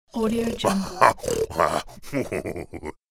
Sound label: cartoon bad pig laugh